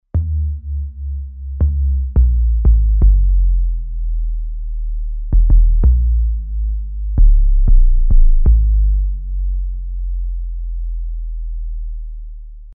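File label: Bass Speed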